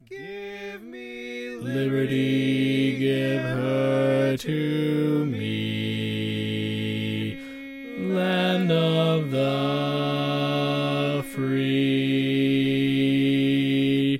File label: Bass, barbershop